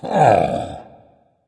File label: thinking dragon; fafnir